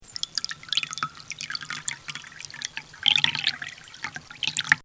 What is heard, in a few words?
Trickling Water; Nature